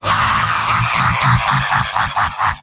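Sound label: yell, robot; heavy; mvm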